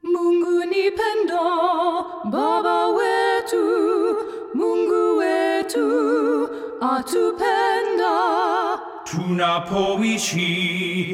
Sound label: Alto